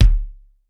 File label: sfx perc